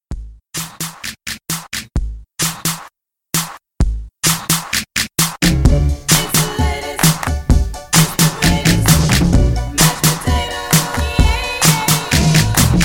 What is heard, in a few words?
60s Pop Re Drum